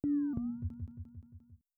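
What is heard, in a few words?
notification, rainy soundscape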